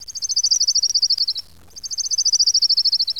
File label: Orange Crn Warb